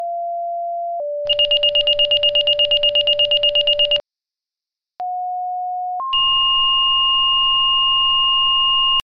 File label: SIREN